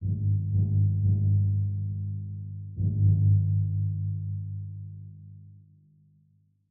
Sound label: Stinger; Sudden Strike 4